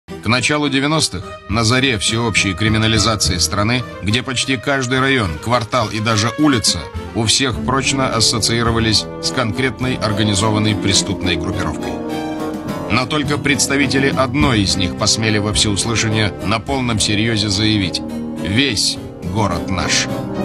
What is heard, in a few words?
drum bass remix